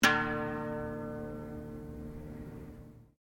Hammer dulcimer strike multi, Old Chinese Hammer Dulcimer; Musical; Strings